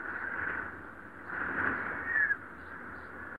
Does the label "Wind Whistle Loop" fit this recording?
Yes